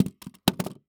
Weapon Pistol Fall On Wood Floor; AdobeCC

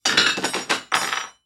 Impact Plate Drop

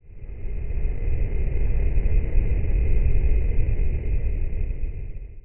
Title Transition Stab Dark Demonic; Production Element

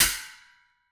Impact Metal Thin Metal Rack

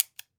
Weapon Gun Pistol 38 357 Revolver Safet On; AdobeCC

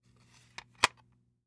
Technology Electronic Plastic Disk Holder Closing

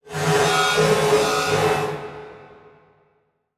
Transition Metallic Phaser; Production Element

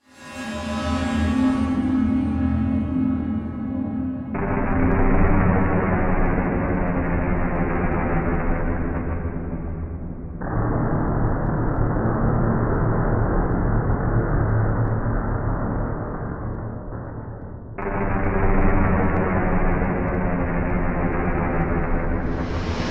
Slow Spooky; Module Production Element Title Imaging; Imaging Elements

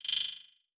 Multimedia Internet CD-Rom Flash Click Multiple

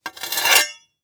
Sword Scrape On Stone, weapons; AdobeCC

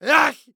Human Sneeze Male

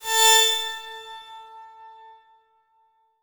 Transition Musical Tone Medium; Production Element; Title Transition; AdobeCC

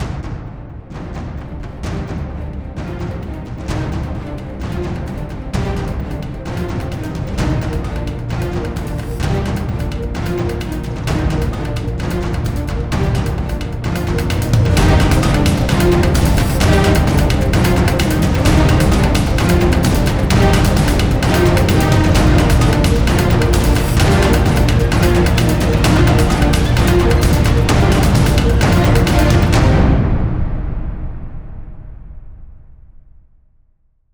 Epic 130BPM, Cinematic Trailer Music; BlueFX; Epic Soundtracks

